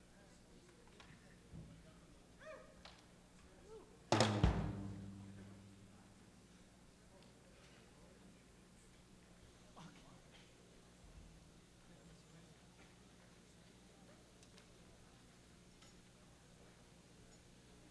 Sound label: Tuning